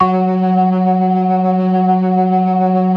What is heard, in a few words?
ORGAN, 4 DRAWBARS; Keith Emerson Signature Series